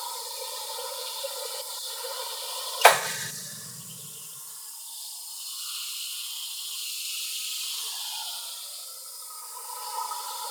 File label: room amb fx pump; Ambient